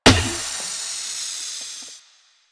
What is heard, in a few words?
Car Tire Pop